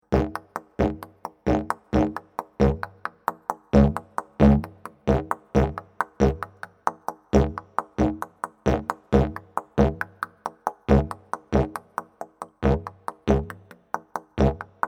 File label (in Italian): ritmo livello avanzato